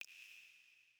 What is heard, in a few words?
water drip light; Rust